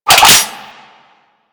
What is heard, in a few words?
Lever Action Pistol Cock; Grineer